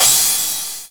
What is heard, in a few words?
cymbal